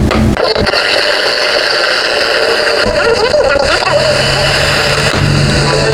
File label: Noise Radio; Ableton Live 12 Lite; Vector; Sprites